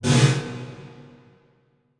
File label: Transition Hit Impact Metal; Adobe; Production Element